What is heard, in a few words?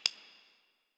Messenger Hover; Ambience; Warframe; Nineteen Ninety Nine